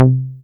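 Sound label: Moog Rouge, Bass; Best Service Gigapack 2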